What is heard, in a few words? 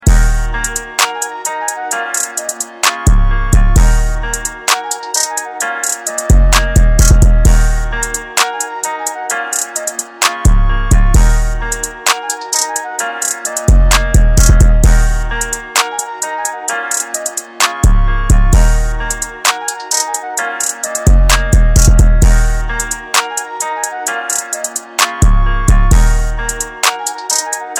INSTRUMENTAL RINGTONE